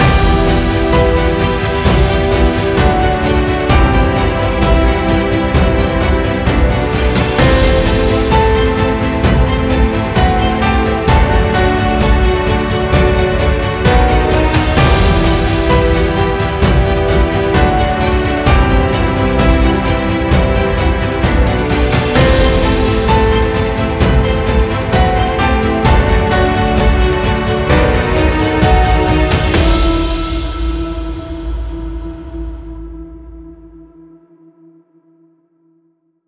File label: tension balansed; By Burak Sound Bank; tension gerilim vol 16